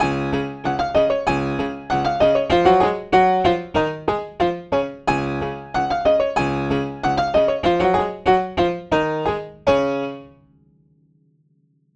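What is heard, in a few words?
open lid live medium stereo loud Schmidt, piano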